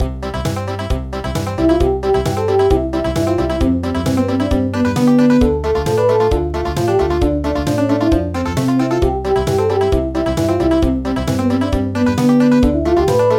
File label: Schottish Country; midi; traditional